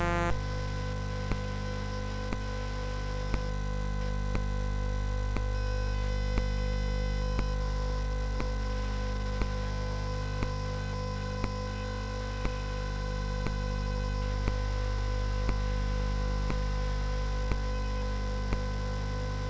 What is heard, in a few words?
Beat Box CSG8580R5; sid